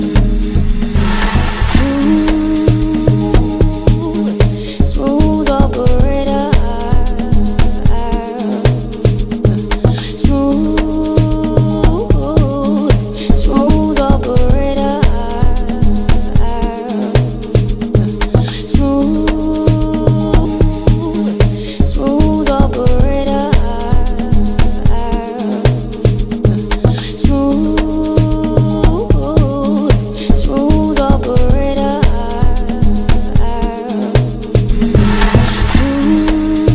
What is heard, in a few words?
Amapiano Remix